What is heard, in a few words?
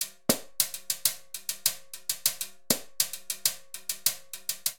Charanga Timbales-200bpm; Latin Percussion; Ableton; Factory Packs